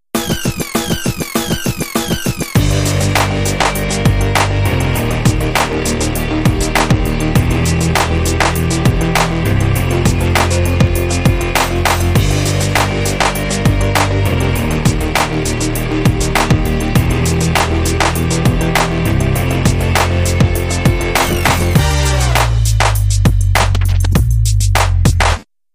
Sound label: Powerful Force